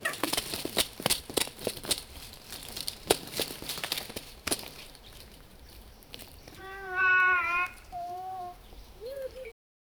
surprised bear voice reac

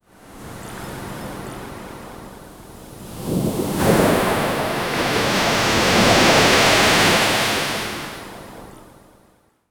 beach

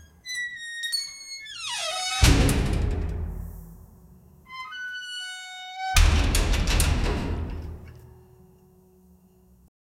realistic metal door crea, warehouse

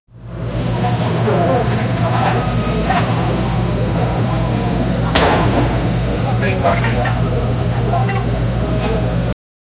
321 go counter sound; 321 go counter sound like

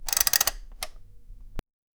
revolver reload clock in, chamber

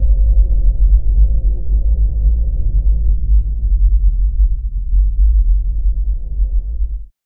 subterranean rumble, underground; subterranean rumble with

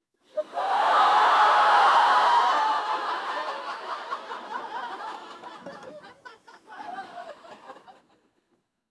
sitcom crowd laughing

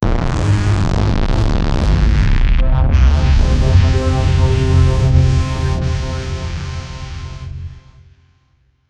sound effect dash

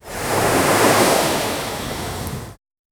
sound of calm sea waves

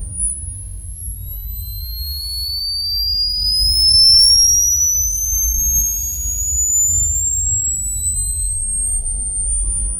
camera tilt up sky, scene change

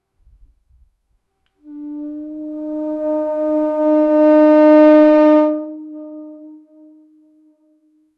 celtic horn being blown

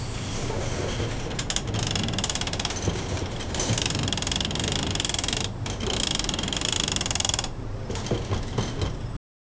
the creak of a spinning

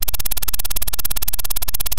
a rhythmic mechanical cli, typing

